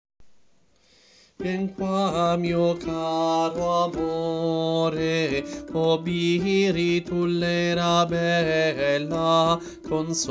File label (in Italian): Contralti, coro; Coro Isola del Giglio; La biritullera